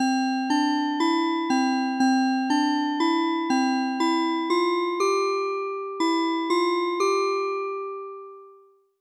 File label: bells